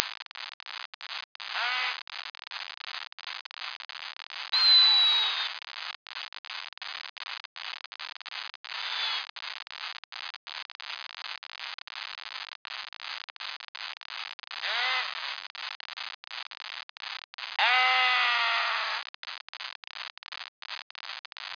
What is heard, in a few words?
mother and pups